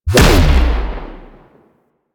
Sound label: Blastor Pistol Explosion; Warframe; Weapons; Corpus